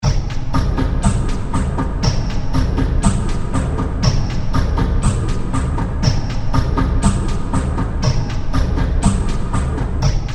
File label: underground rave